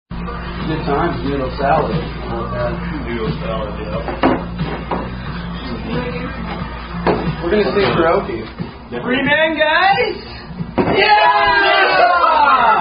drinking game; 3 Man; Three Man Drinking Game

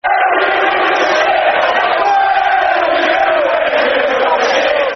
Where Were You, Football Chants; Man City Mcfc

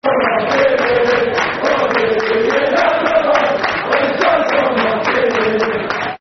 When The Stripes Go Marching In, Football Chants; West Bromwich Albion Wba; fanchants

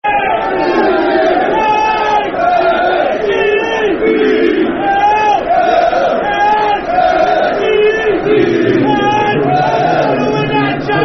Spell It Out C H E L S E A Football Chants; Soccer Song

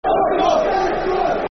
Football Chant (Soccer Songs); Get Into 'em; Leicester City Lcfc

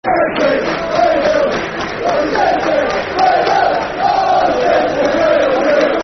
Football Chants (Soccer Songs); We Love You City; Hull City Hcfc

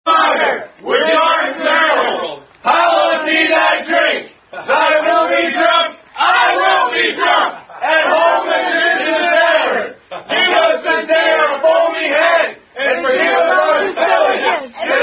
College Drinking Beer Chant; Our Father Who Art In Heaven (Parody)

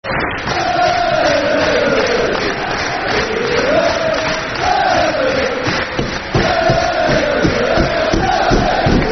Football Chant, Soccer Songs; Great Escape Southend; Southend United Sufc

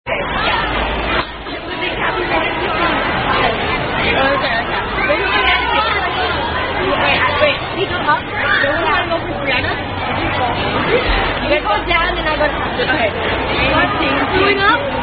Ring-A-Ching-Ching

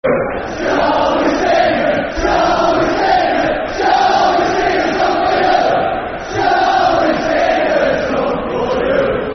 Football Chants (Soccer Songs); Shall We Sing A Song For You; Barnsley Bfc

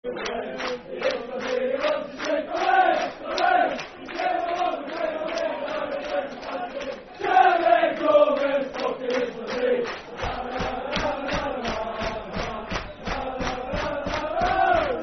Football Chant, Dundee United; Jimmy Gomis; Dundee United Dufc

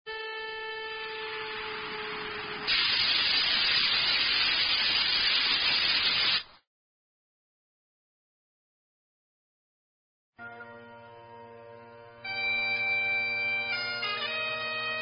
French Rugby Song At End; Rcdr Life

